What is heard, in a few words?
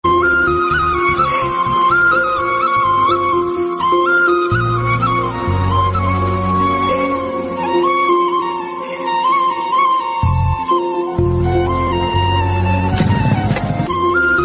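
background music Ringtone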